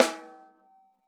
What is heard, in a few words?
RAWSD2V1, Drums Acoustic; USB Soundscan vol.10; AKAI; 90s sample CD; ALL SD